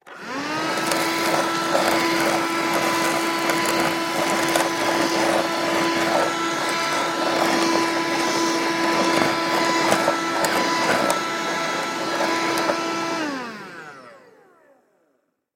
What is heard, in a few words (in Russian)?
zvuki ruchnogo pylesosa